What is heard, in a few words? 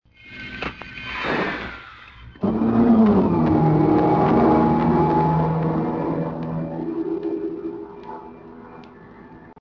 torpedo